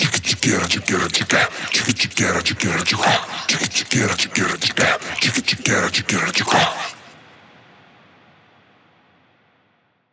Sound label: 138 Shamanic Voice (Wet), Psytrance